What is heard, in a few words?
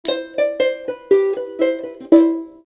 Saung-Gauk